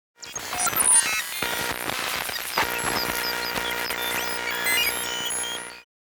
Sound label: Digital hologram interface corrupted data; Sci-Fi Computer